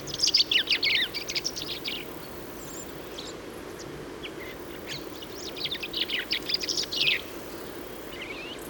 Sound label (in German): Buchfink; Erling WM; Michle Peron